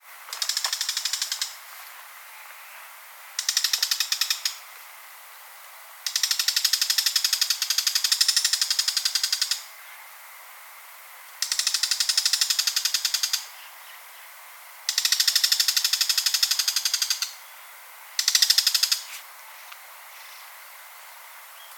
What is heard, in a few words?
Lanius bucephalus